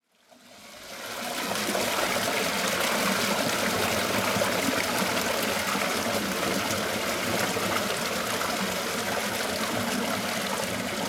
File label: Rushing Stream